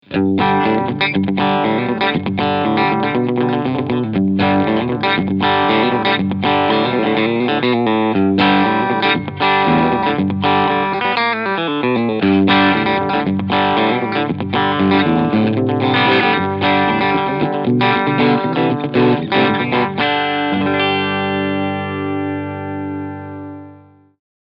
Cleansound Steg PU

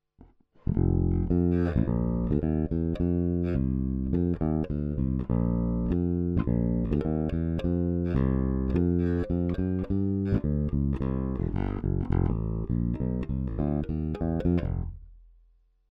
E Bass direkt

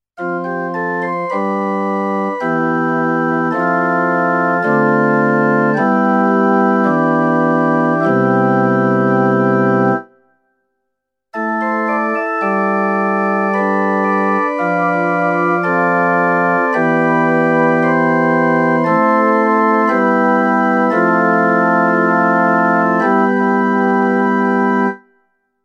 Orgel; Roland FP-10

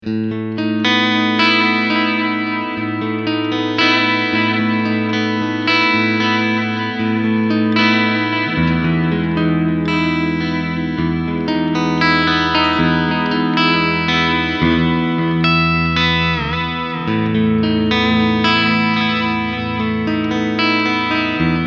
Wasser Delay

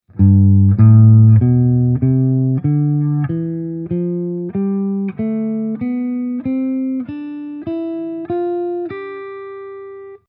mixolydisch auf

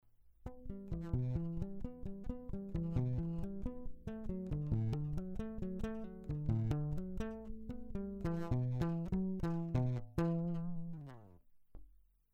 Charvel pur

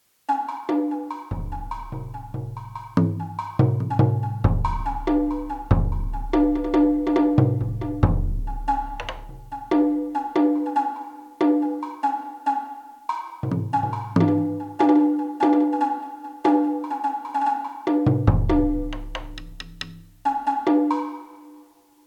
ROLAND SPD-SX Pro Japanische Trommeln

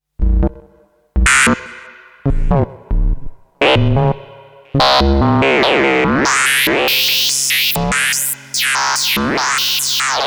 Katzenmusik; Behringer CAT

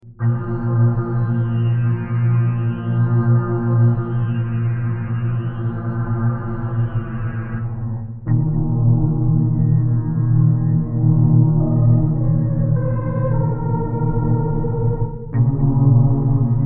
recht extreme Klangverbiegung durch TWIST

